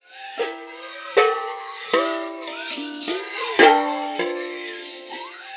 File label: GLISS; Xsample VOL-4